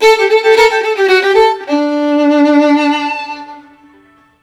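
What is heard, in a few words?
VIOLIN LOOP, Asian Traditions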